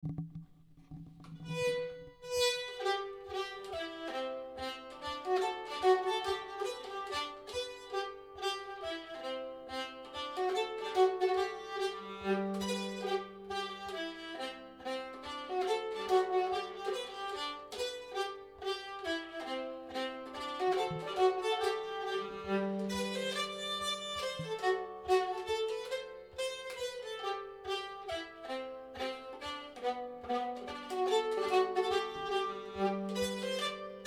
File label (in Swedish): mazurka; syversens